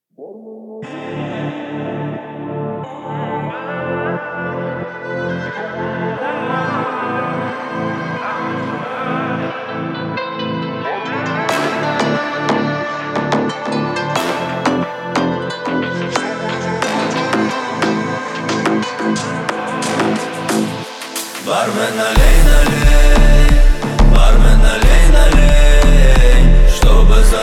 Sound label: 33-37Hz bass